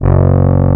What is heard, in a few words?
G1, ANA HRN